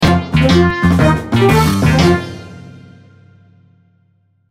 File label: Jingle Fantasy; rpg maker mv